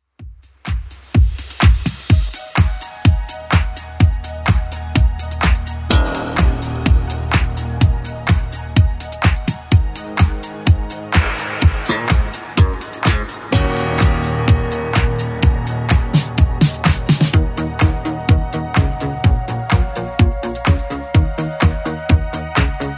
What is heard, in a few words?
80s Club Edit 126bpm